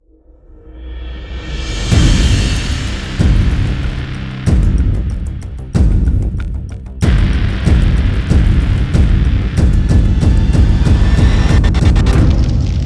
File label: Cinematic Logo Intro